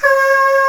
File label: FAH F#4; East West; Global Sounds